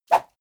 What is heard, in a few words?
whoosh small fast